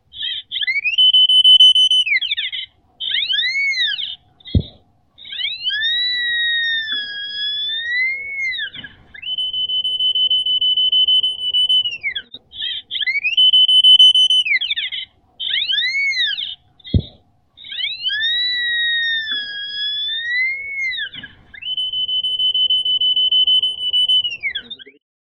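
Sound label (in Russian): bomb detector, explosives detector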